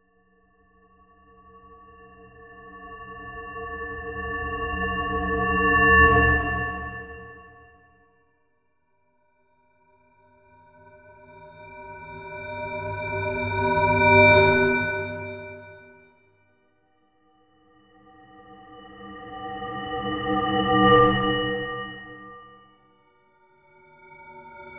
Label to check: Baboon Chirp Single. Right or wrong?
Wrong